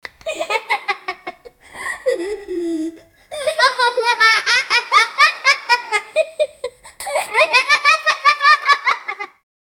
kids laughing; children

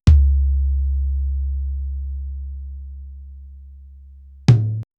asmr soft drums recorded, studio

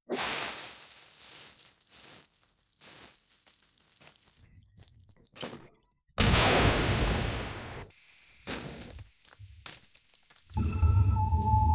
explosive bursting diarr

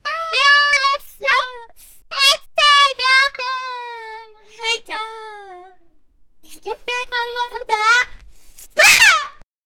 a kid lough, child

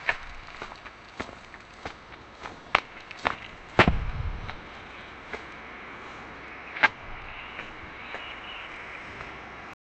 walking then gun shots

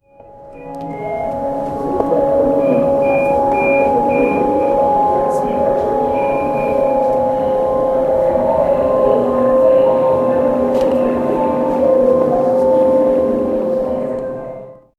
soft background sound of, airport

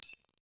short bright coin collect

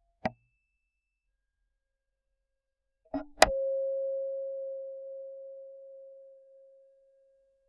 soft gentle click sound o; mechanism

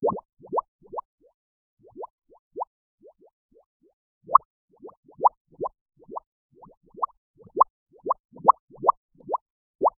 a final big bubble satisf, pop

